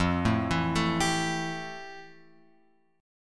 F Major 9th F; FM9 Chord {1 0 2 2 X 3} arpeggiated

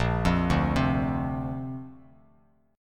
A sharp Major Add 9th, Bass Standard; arpeggiated

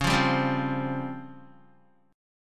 Db Major 7th Flat 5th Db down Guitar; 4F,4A,5C,5G,5C,6E; strummed; chord shape; JGuitar

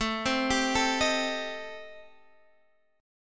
A-Minor 7th Flat 5th, Guitar Standard, chord; Am7b5; x,12,10,12,10,11; arpeggiated; capo=2

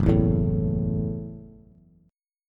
F sharp Minor 7th F sharp, Bass

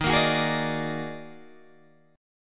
D sharp Minor Major 11th, Guitar, Open G

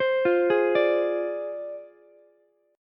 D Minor 7th Flat 5th Ukulele; Dm7b5; arpeggiated

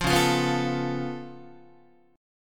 C sharp Augmented 7th C sharp down Guitar, chord; strummed; JGuitar

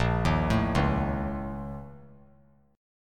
Bb Minor Major 7th Double Flat 5th; BbmM7bb5; arpeggiated; 10,10,12,11; JGuitar

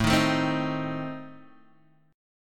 A Diminished A, Guitar Half Step Down

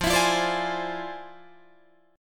F Minor Major 13th, Guitar, DADGAD

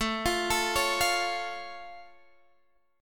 A Augmented A down

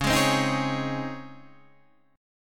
C sharp Major 7th Suspended 2nd

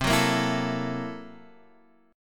Gb 7th Sharp 9th Flat 5th C, guitar

